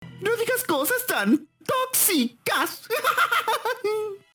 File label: nyehehehe